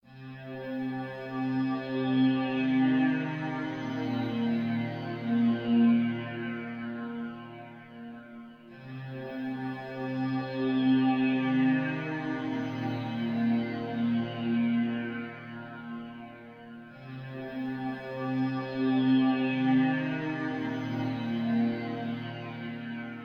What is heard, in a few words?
Dark Fantasy Open Looping; altariaonline